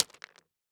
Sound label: Impact Rocks Hit By Wood Stick; Impacts